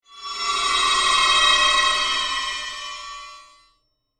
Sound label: Whoosh Ghostly, Transition; Production Element, Imaging Element, Accent; Whoosh Sound Effects